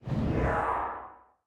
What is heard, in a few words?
swish; smite; god thanatos